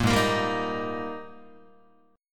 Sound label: G sharp Major 7th Suspended 2nd Guitar; 1,4,1,5,2,2; JGuitar